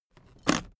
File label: suitcase latch lock jump open hard